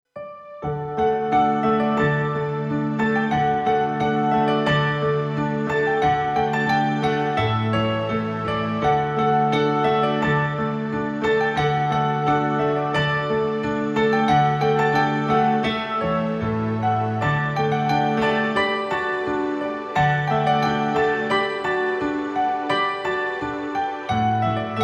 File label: Piano; Spring (Four Seasons)